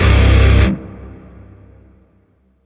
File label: Shawzin Phrygian Chord A; Music; Warframe; Grineer; Chords